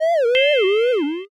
stun

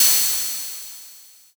crash cymbal

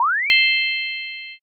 light magic

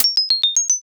cracker

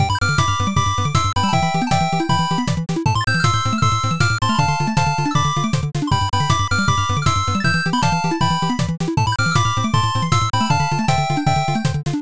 bgm drive

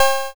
cowbell

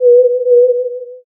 owl

